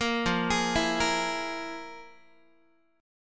F Minor Major 7th Double Flat 5th, Guitar; FmM7bb5 arpeggiated; JGuitar

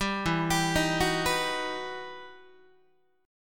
E Minor Major 9th E down Guitar; 10,12,10,13,10,10; 4A,4E,5A,5D,5G#,6C#; arpeggiated; JGuitar

